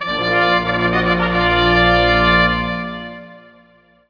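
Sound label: music sting reward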